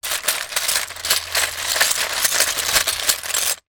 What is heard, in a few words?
shells movement many; foley; zapsplat